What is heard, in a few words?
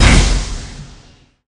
effect boom